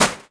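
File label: metal bump small; objects; Star Wars Obi-Wan; XBOX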